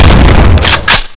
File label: spas12, weapons; brainbread